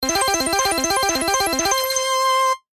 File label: Slot machine casino medium win